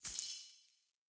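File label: Staff Caster Projectile Destroy; Weapons; Player; Staves; Starter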